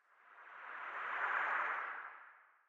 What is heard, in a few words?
long swoosh